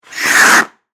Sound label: Ice Skates Blade, Scrape, Stop; Sports; Ice Skating